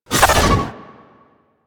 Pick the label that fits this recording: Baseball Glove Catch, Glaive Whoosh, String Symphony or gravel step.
Glaive Whoosh